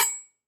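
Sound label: metal knife thrown into a wall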